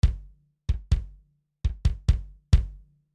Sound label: Basedrum